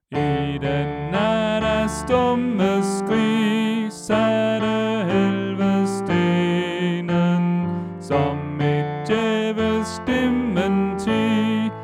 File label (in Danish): Tenor; Påske